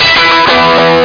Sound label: Electric Guitar; instruments